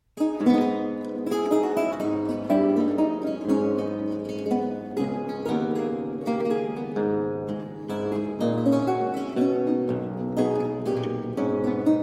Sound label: Duet II Courante in A Minor, Lute; Anton Logy